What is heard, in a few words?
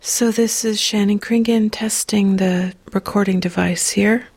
testing vocals